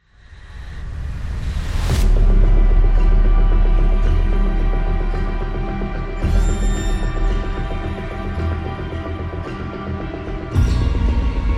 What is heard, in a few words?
Thriller